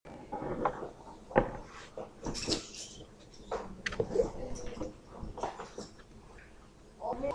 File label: kick